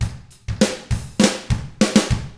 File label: ROCK, Drums; ILIO; Double Platinum Drums 1